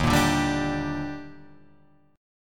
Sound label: Eb Major 7th, Guitar Drop D; 1,1,0,0,3,3; down